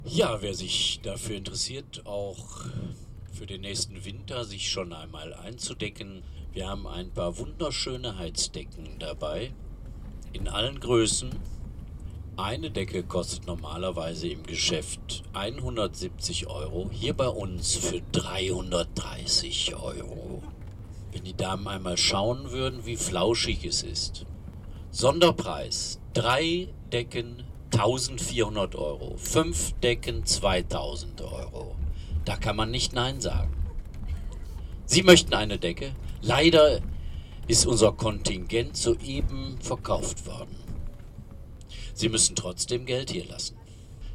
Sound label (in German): Fahrt Ton